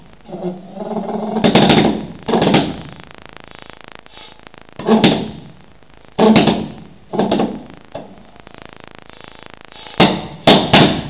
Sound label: pulsejet start